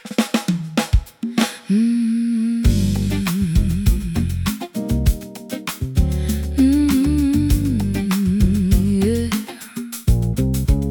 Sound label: Samba Blues